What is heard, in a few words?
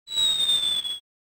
fall in a hole; crash bandicoot